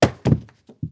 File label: BF Limbs Only Down; Fairlight Sound Lib